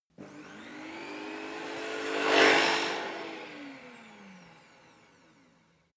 fly by small motor medium

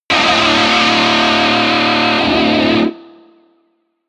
distortion

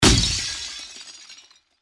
car crash

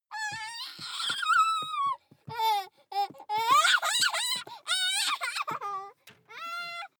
child laugh tickle strained

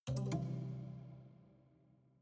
haunted strings chord major damped

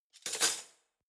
cutlery spoons drawer place

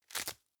velcro open slow

